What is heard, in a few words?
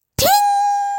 ting